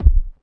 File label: natural kick drum one shot a sharp key, kickdrum sample tuned to the A# note